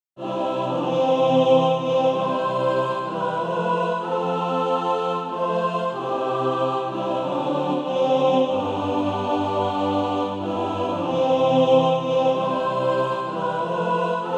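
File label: mixed, SATB Practice Music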